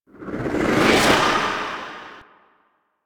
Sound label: Queens Chamber Queen Blast Charge; Ambience; Warframe; The War Within